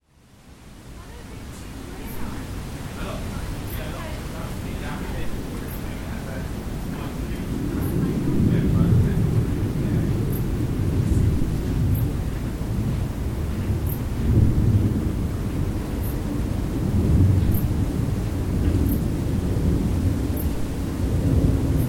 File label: wind and insects at blagdon lake bristol